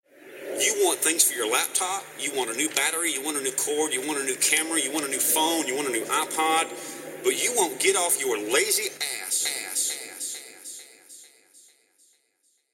Angry Parent Rap

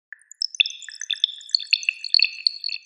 Water Drops

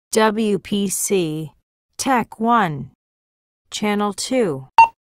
motorola tac tone

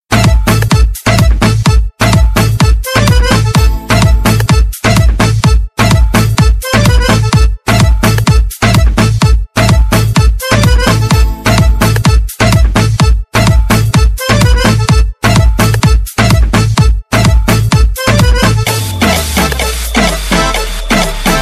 Turkish Remix

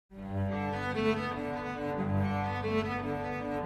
Cello suite 1 Bach

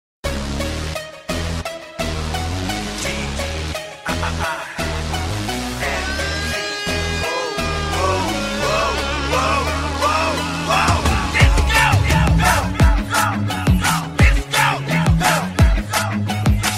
Disco

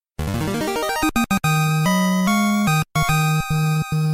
NES Fanfare; FFIII

